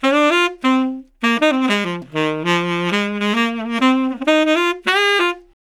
SAX LICK, BRASS LICKS; Ueberschall; Jam Box

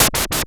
Noise Hit

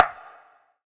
Beatbox Perc, Hybrid Beatbox Drums; Antidote Advent

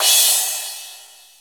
CRACKED; Dance Set 1

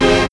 HIT, STABS, House

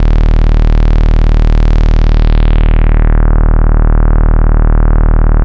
Ju Bass, Bass One-Shots, Drum n Bass; KJ Sawka - Drum n Bass & Beyond

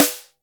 Boss DR-660 Snare, Drum Machines

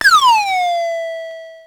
Drum, Electro-Harmonix Spacedrum

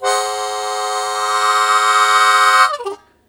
Blues Chord Attack Ab1, Harmonica; 90 s Sample CDs; Zero G

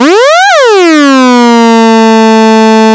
MEMORYMOOG

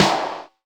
POP SNARE, Drum Mashines, SR-16 DRUMS; EdgeSounds

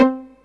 PIZZ

